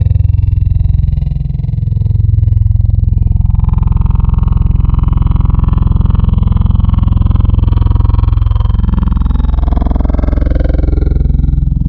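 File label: Vocal Formant Bot, wavetable; Synthetic's Serum Collection; Echo Sound Works Core Tables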